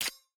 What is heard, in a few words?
click, menu